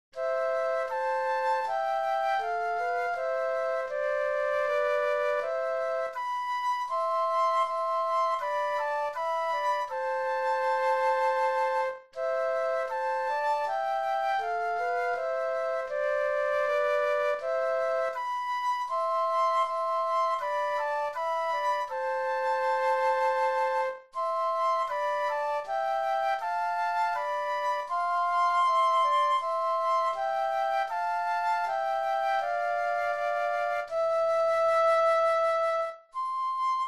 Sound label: Flute Duet